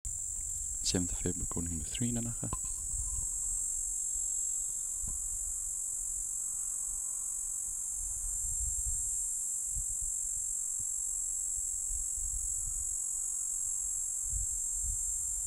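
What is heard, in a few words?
Platypleura plumosa Nanaga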